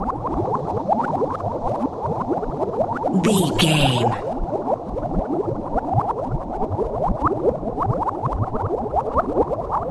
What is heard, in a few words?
potion bubbles brewing loop